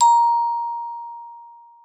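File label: glockenspiel A#4; Xsample VOL-4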